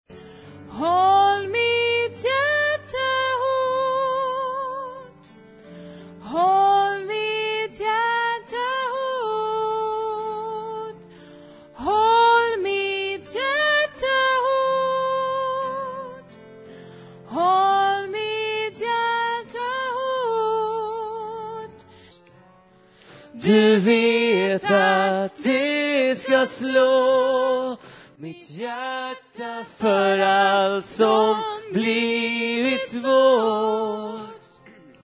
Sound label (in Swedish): sopran